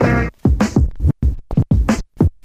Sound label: cutting a beat, Drum loops